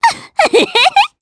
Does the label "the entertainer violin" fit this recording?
No